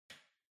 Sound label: Light Switch-Rocker (Far-Hall-Gentle)